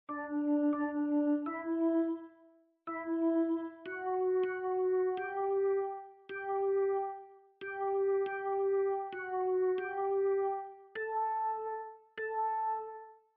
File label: virtual piano